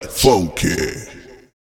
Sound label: Funky Filler